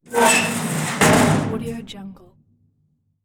metal dumpster door closing; domestic sounds

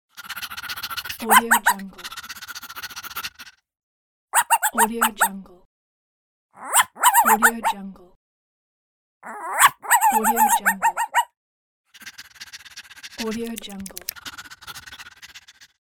cartoon dog; پارس سگ کوچولو

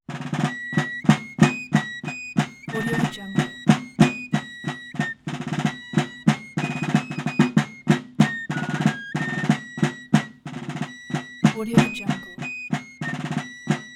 yankee doodle fife drum transition; transitions and movement

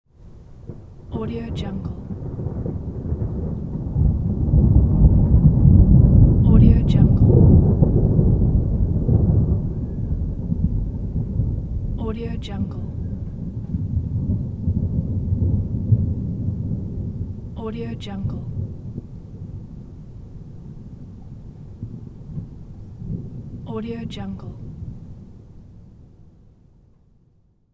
thunder and wind

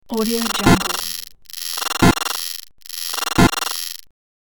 buzzing fault repeats itself; interface sounds; وزوز خطای اتصال

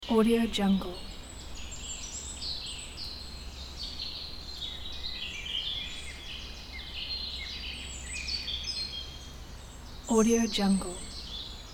summer forest ambience with birds loop; جنگل در تابستان

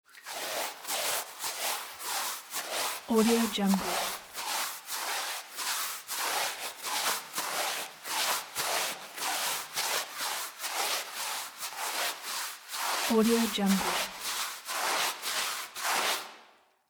interior wall priming with brush; domestic sounds

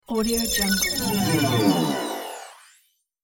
alien space disappear spell; game sounds; ناپدید شدن در فضا

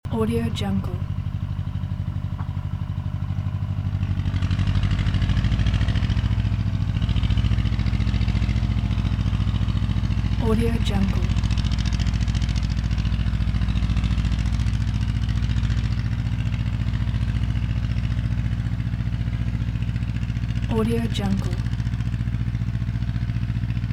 tractor working on field at late summer; loop; کار کردن تراکتور; industrial sounds

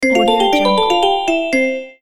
smartphone ringtone; interface sounds

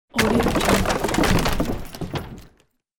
attic garbage fall; domestic sounds

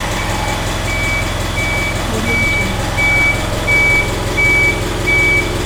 garbage truck engine and reverse signal; کامیون; urban sounds

